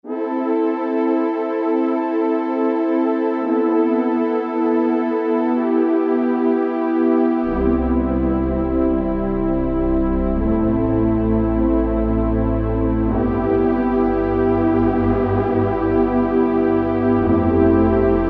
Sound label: Xpando Pad, Alesis QS8; Factory Preset examples